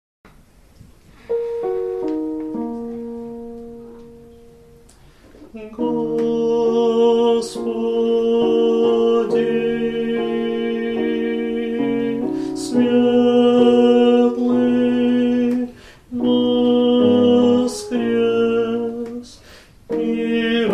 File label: tenor; Svetloe Voskresenie